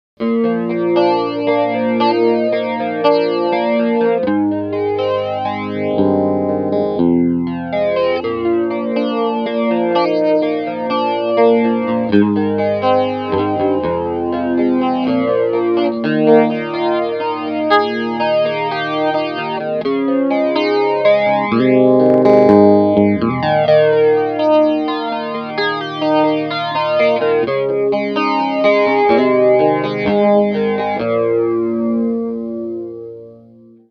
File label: flanger